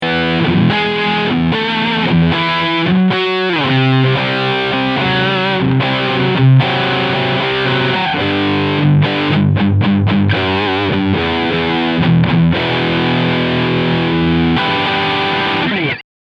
PRS Riff Steg Humbucker; Studio Wood Library; CobaltBlue

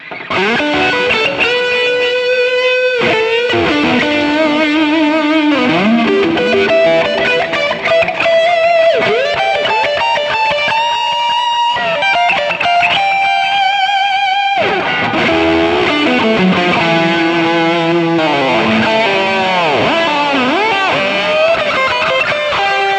Gitarren Plug-ins im Vergleich Amplitube 5 Highgain Lead

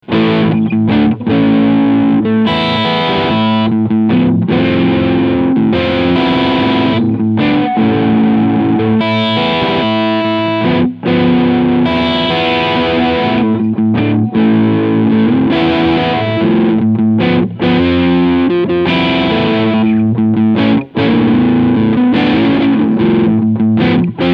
Gibson Les Paul Standard 60s Crunch beide Humbucker

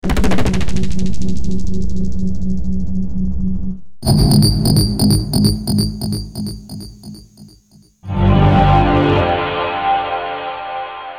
Klangbeispiele FX1

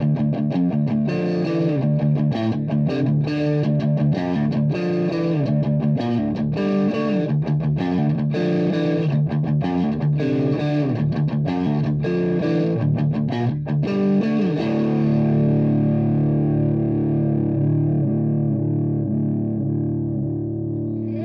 Verzerrer Pedale Mid Gain; HB Vintage

